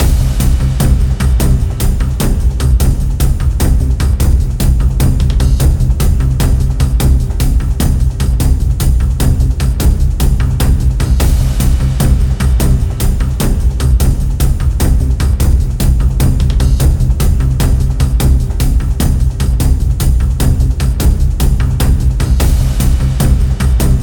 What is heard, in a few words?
tension violin composition; gerilim; By Burak Sound Bank; tension vol 17